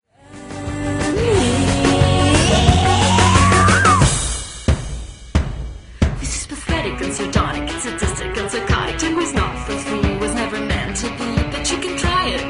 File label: Tango